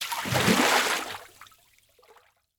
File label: Liquid Water Water Push